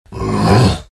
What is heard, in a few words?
exert; arc warden